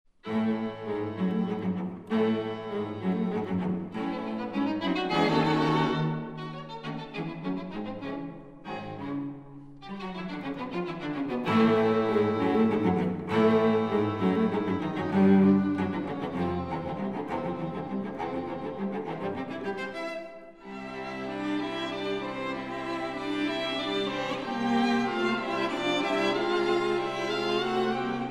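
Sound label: string quintet; goldmark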